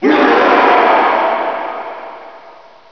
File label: death; quake2; necro